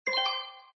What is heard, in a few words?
earn Experience